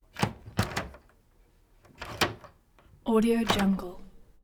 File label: deadbolt dull metal slide open and close